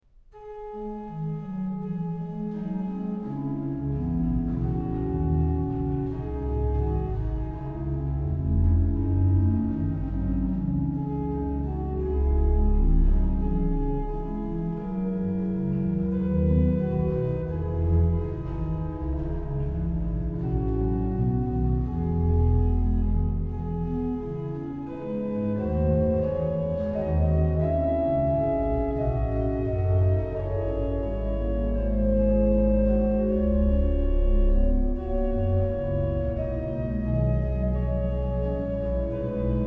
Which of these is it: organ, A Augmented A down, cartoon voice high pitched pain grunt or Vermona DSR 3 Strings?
organ